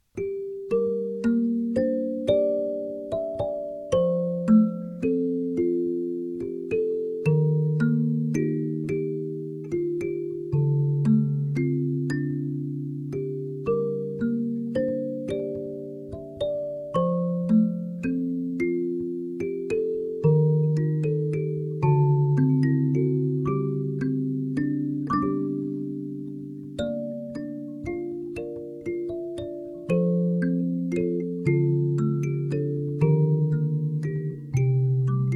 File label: chromatic, kalimba